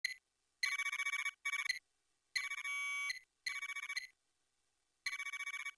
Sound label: beeps; trek